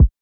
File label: Kick, hip hop; STYLE KITS